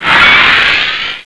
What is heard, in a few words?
Powerup; Swoop 1.0.0; Ambrosia Software